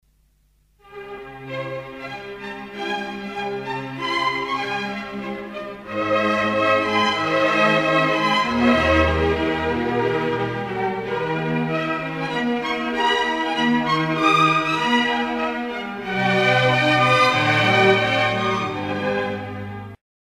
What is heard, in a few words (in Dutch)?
Pizzicato strijken